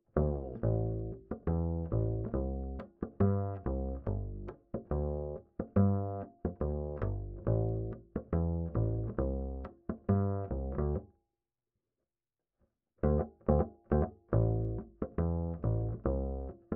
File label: Bass Line